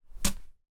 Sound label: sport cricket bat catch in hand